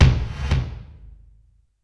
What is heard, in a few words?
Gated Tom; Gated Reverb; Univerb; musicradar